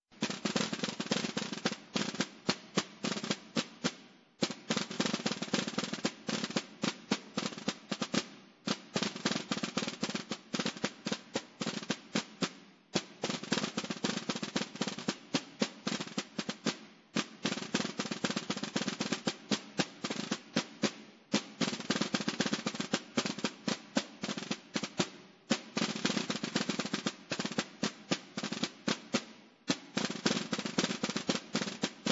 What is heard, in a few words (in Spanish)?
marcha urrea, tambores; Urrea de Gaén